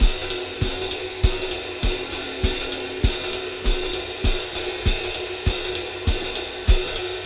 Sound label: Cym Beat 99 BPM Cym; AMG - Keith LeBlanc Phat Butt Jams